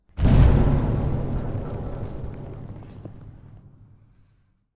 structure blunt; Barotrauma; Subsurface; Damage

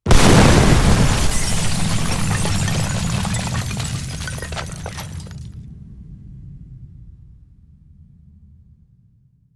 explosion, Reactor; Barotrauma; Subsurface

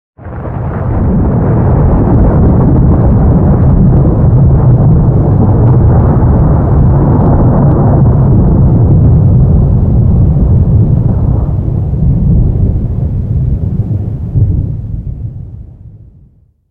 Piezo crystal far; Barotraumatic

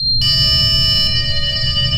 sonar Ping Water Medium Beacon; Barotrauma; Real Sonar

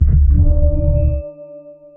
sonar Ping Air Close Directional; Barotrauma; Real Sonar

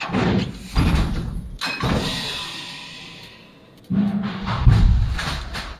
railgun reload; Barotrauma; EK modern

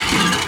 metal run, Footsteps; Thalassophobia Restored

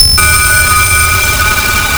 sonar Ping Water Very Close Beacon; Barotrauma; Real Sonar

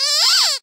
Rubber Duck; Impact; DynamicEuropa

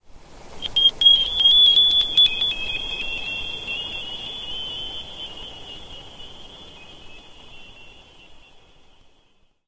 Sonar Sweep; Barotraumatic; Carrier Leviathan

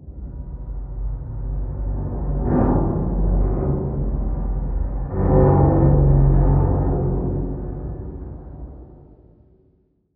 hull damage; Barotrauma; Barotraumatic; Hermits

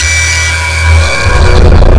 sonar Ping Water Close Shuttle; Barotrauma; Real Sonar

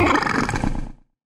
damage; Barotraumatic; Crook

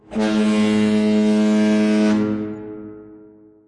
bus horn; Barotrauma; Barotraumatic

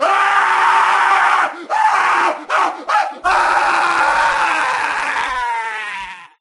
male on fire; Voice; Neurotrauma; Barotrauma

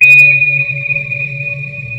sonar Ping Water Medium Directional; Barotrauma; Real Sonar

